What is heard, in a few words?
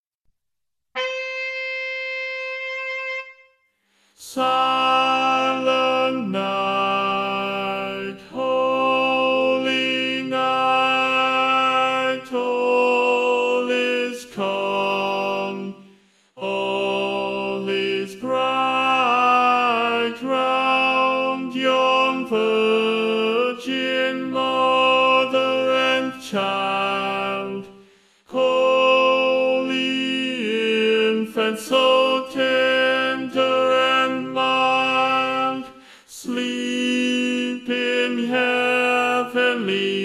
silent night bari, baritone